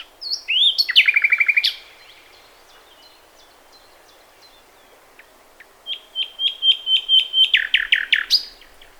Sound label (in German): Nachtigall; Gaubuettelbrunn; Rainer Jahn